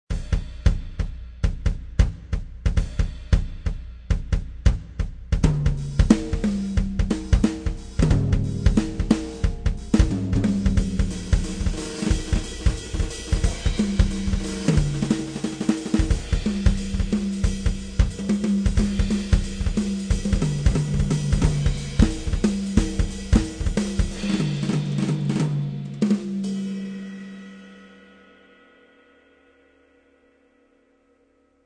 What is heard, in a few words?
Loop Jazz Drum Solo 180, Jazz drum solo at 180 bpm.; Royalty Free Drum Loops; Music Production Loops